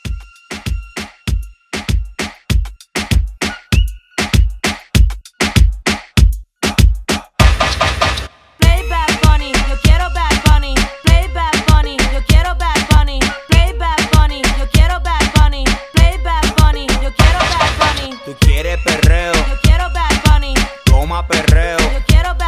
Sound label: Reggaeton 98